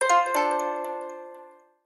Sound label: Notification Tone